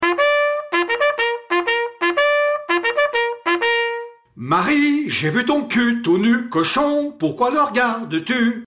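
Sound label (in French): Refrains du 9 chasseurs d'orléans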